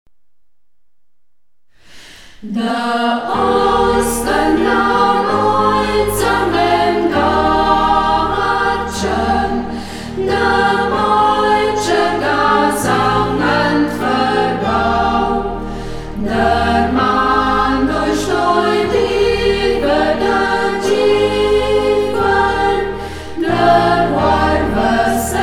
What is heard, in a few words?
chor, mundart; Ortsmundart: Burgberg; Burgberger Chor; Hegt wird gesangen